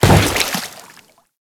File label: Grineer Step Water, Footsteps; Enemies; Warframe